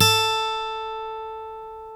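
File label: A3 acoustic guitar, 6-STRING; InVision Interactive; Lightware VOL-1; Instruments & Percussions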